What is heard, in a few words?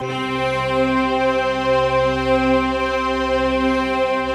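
C 4, BELL STRINGS; Best Service; Advanced Media Trax Modern Composer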